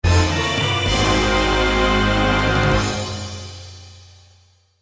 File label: Get Item